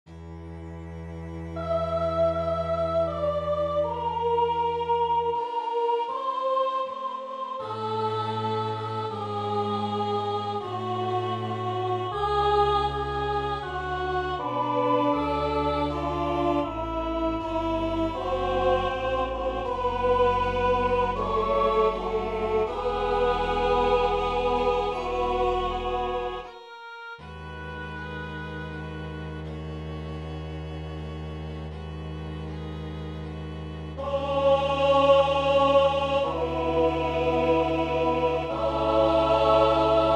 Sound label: Benedictus tutti; Radole